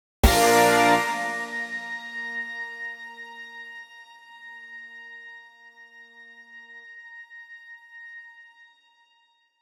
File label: chord